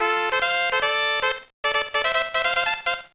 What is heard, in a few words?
Jingle; Catz; GBA